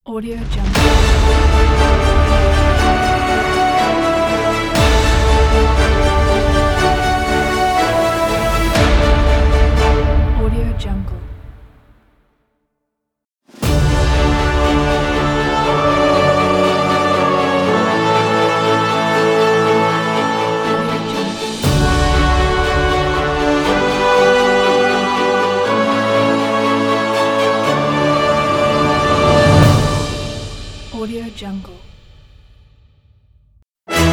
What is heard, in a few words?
awards fanfare logo pack